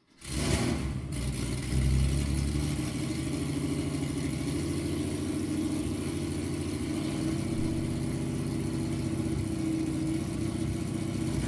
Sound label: Porsche Dakar; Rennlegenden erwachen und kreischen; Porsche Soundnacht 2015